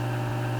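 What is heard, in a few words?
beverage machine; ambience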